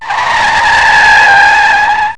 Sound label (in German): brems; robossy